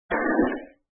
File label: slide